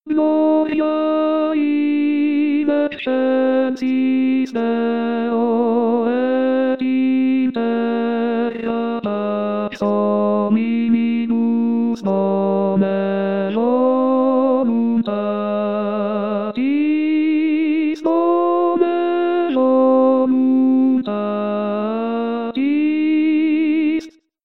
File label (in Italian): Gloria Ritornello Bassi; messe